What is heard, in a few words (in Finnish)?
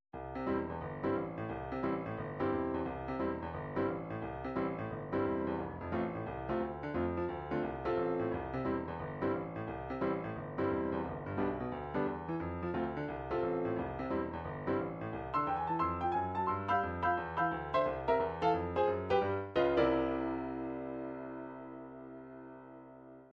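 piano rock; Pianorokkia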